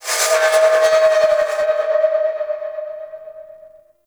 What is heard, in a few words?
Metal Groans And Scrapes; Ambience; Warframe; Grineer; Asteroid